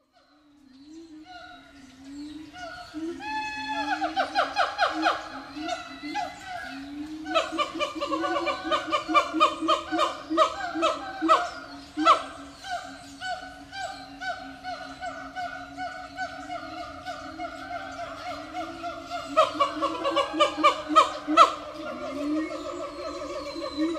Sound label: Siamang Monkeys Whoo